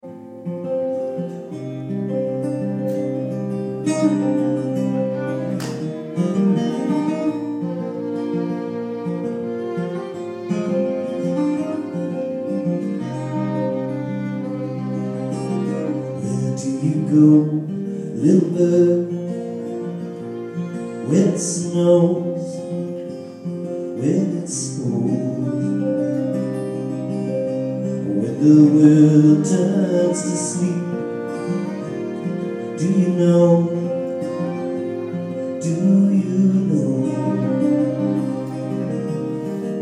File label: Violin